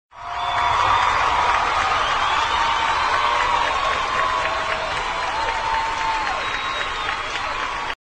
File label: Applause